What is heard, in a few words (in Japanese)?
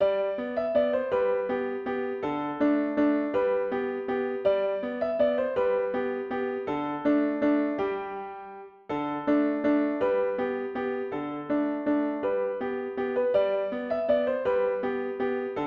ピアノ